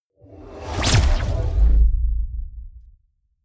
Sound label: sci-fi plasma gun fire swoosh; pistolen gewehre